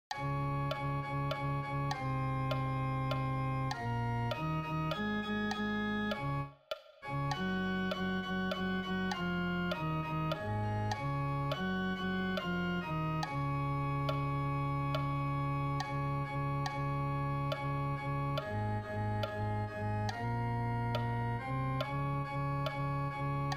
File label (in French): Basse; Peuple de baptisés